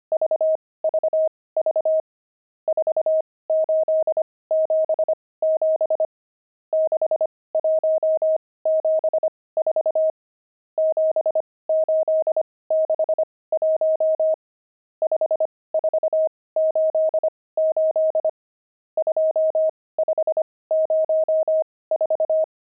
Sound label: pangram, intermediate pangrams files